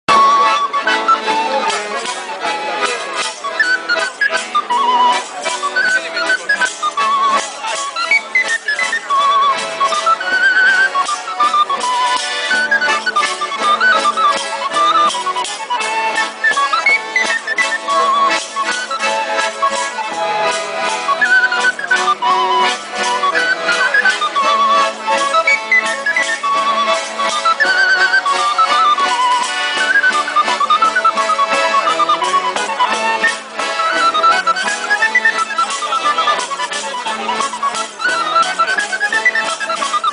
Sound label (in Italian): Mazurka a furriari, canzoni siciliane